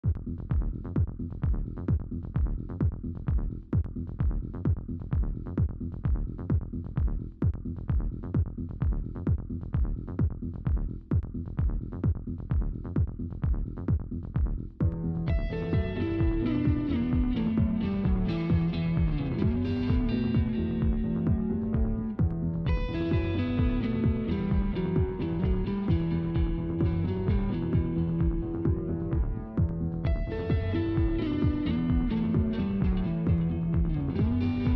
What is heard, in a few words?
music dance